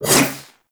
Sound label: Kunai Whoosh; Warframe; Weapons; Stalker Kunai